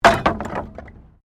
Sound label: Wood Metal Fall; Material components for constructing more complex sounds; Materials